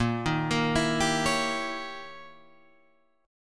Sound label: D sharp 7th Flat 9th, A sharp